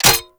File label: Low Clip; Warframe; Scaldra AK